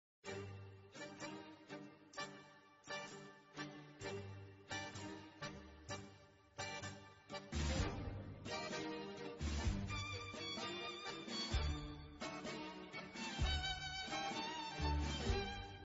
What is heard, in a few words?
argentina tango